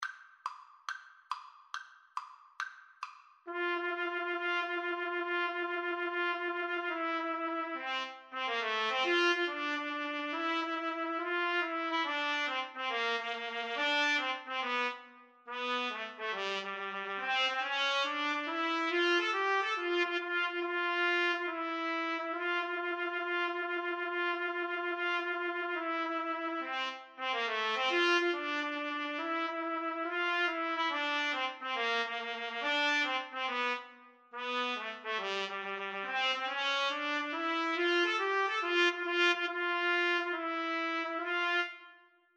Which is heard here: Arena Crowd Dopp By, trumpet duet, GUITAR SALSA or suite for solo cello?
trumpet duet